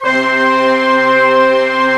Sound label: Orchestra; 90s Sample CDs; Club-50 - Foundations Roland; Sy Str